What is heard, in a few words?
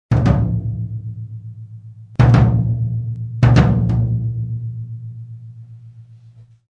second floor tom 16